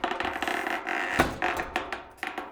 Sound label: metal stress; ambient; Counter Strike Model Pack; CS:GO; survival